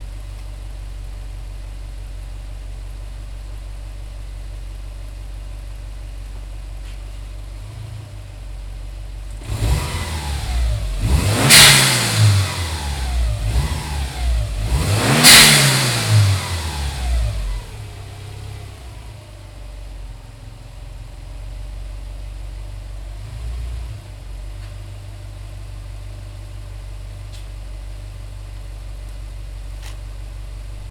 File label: Subaru Legacy GT GFB BOV Exterior Front Stationary; Leinninger; 2011